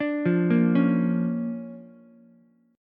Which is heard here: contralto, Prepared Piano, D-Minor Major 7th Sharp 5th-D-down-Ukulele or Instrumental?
D-Minor Major 7th Sharp 5th-D-down-Ukulele